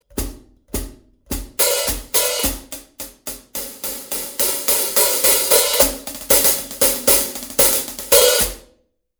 studio hihat, cymbal; sabian

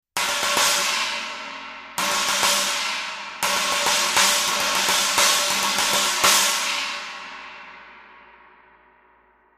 chinese 18, cymbal; sabian; hhx

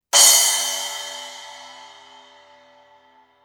splash 10 hit, cymbal; sabian

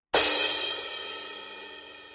crash 16 hit, cymbal; sabian; hhx evol

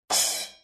splash 13 choke, cymbal; sabian; aa sabor

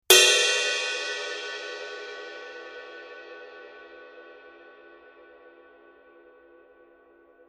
stage ride, cymbal; sabian; hhx

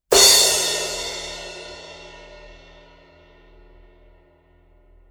med thin crash, cymbal; sabian

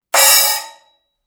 splash 10 choke, cymbal; sabian; b8pro

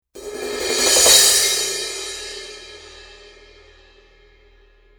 thin crash 16, cymbal; sabian

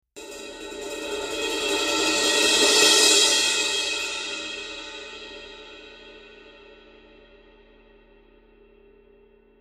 stage crash 18, cymbal; sabian; hhx

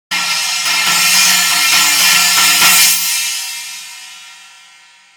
b8 mini ch 14, cymbal, sabian

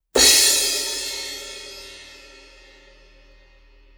dark crash 16, cymbal; sabian

